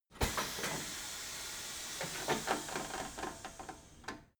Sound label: tire changing machine hydraulic arm separator out